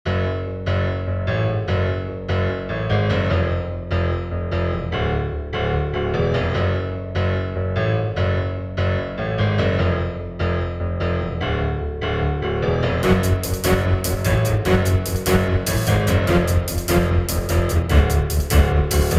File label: Instrumental, 148BPM